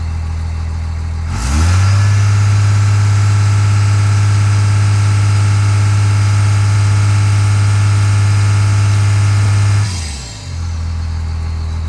Truck Ram Diesel Fast Idle; Trucks

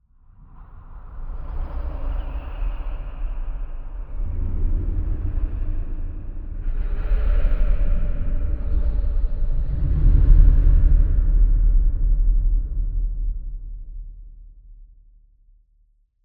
Rumble Scary Voices Short Pad; Hollywood Edge; sound designer's toolkit v2

